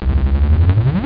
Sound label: power up